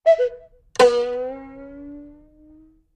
Cuckoo Bird Followed By Sustained, String Pluck Boing; Cuckoo Clock Breaks